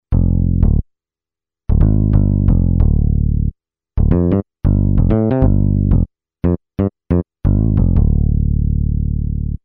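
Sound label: finger bass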